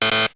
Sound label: error; checkpoints